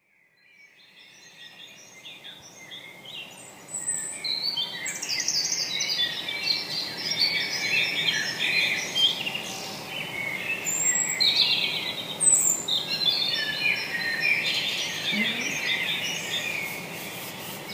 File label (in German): Vogelstimmen am Morgen